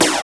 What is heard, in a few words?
SNR FLANG, Snares; Roland - Rhythm Section; Snares 5